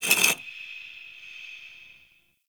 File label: Stone Small Scratches; Warframe; Ambience; Entrati